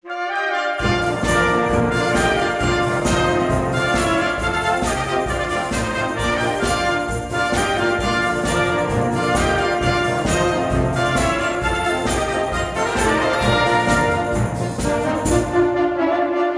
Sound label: polka